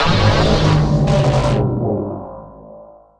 soul pain; darwinia

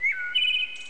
parrot crit stop

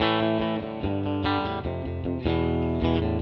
Guitars; ACID Music; Tele Dreams

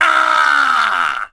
death

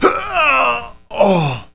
DEATH; kingpin

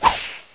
Swish Medium

